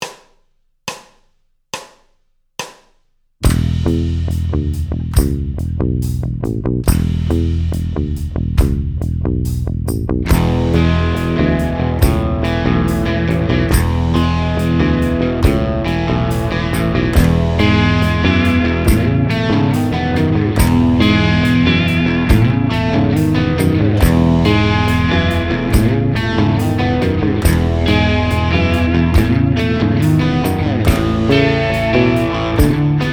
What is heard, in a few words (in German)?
harmonische verschiebung mit bass